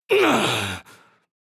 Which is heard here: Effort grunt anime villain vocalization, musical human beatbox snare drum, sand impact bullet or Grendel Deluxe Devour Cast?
Effort grunt anime villain vocalization